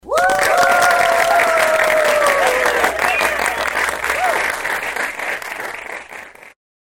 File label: applause